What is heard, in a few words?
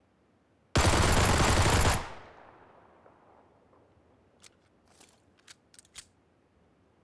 P18c full auto